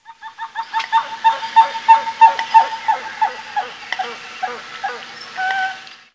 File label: grebe; pakshi